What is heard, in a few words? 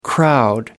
crowd